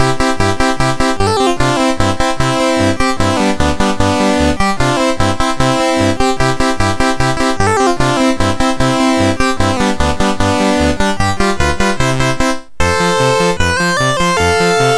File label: Helena Polka MOS6581